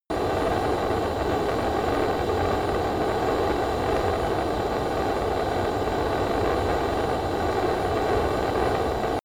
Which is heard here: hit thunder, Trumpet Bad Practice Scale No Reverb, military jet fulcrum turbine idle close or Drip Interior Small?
military jet fulcrum turbine idle close